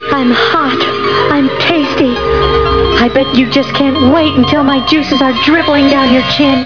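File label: DRIBBLING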